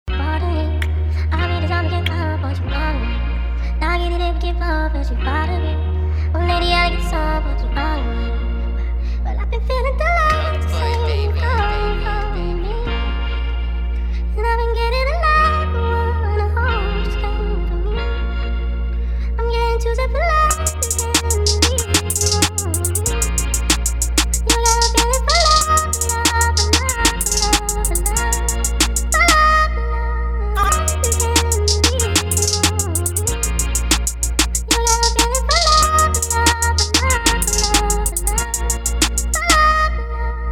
Instrumental

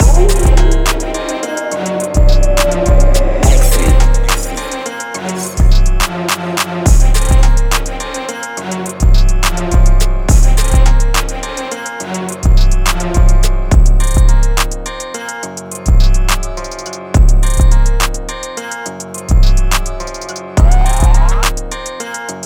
Instrumental

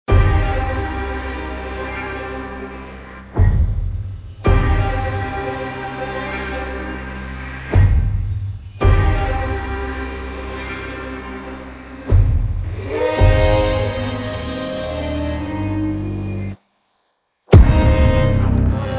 Instrumental

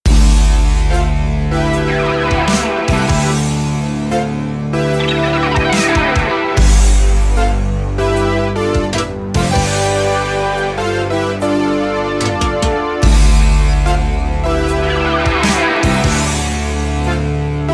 Interlude Instrumental

